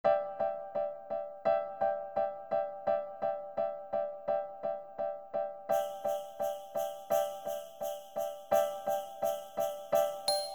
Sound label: jingle bells